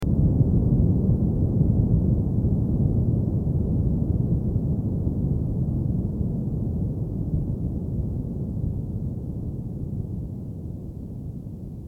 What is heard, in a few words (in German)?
lawine; Katastrophen